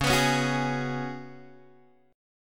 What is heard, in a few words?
C sharp 7th Suspended 4th C sharp, Guitar Open G